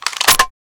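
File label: weapon foley m40a3 end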